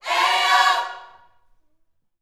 Vocal Gospel PHRASE

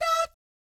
"DAT" E5, vocal jazz fx; Spectrasonics; Vocal Planet Vol.3 Jazz Fx

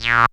SYN TON; E-Lab; X Static Goldmine 2; akai

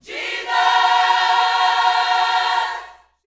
YOUTH CH PHRASE, Vocal Planet Gospel

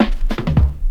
DRMROLL; E-Lab; X Static Goldmine 2

